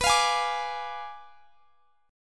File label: D Diminished 7th B